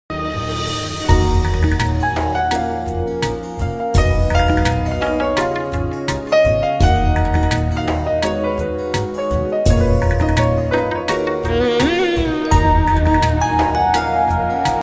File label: instrumental ringtone flute music ringtone love song; veer zaara